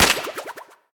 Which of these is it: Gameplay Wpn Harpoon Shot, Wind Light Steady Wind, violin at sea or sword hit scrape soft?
Gameplay Wpn Harpoon Shot